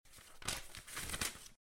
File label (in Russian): dva bystryh perelistyvanija tonkih listov bumaga